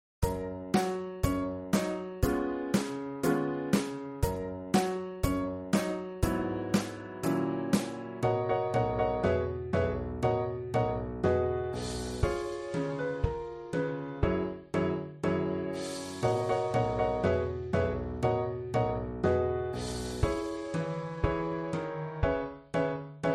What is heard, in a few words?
DRUM